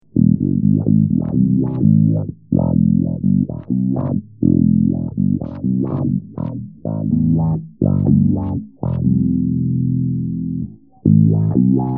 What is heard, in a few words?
bass wah, Tri-Wah